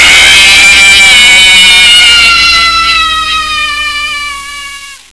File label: Woman, People; Sound Library 2000; Wayzata Technology